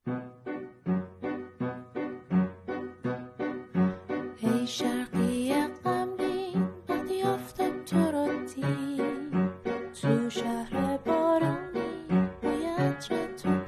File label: Piano